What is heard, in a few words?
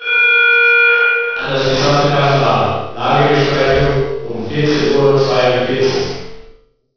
speaker